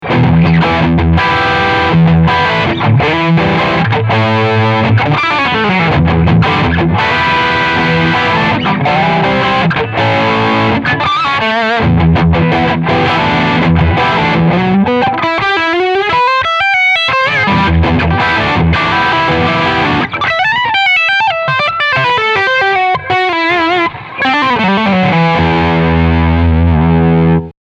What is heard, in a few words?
CR Riffsound